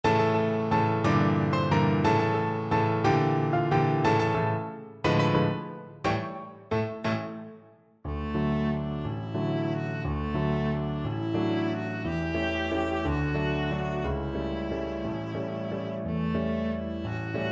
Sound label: merry widow waltz, viola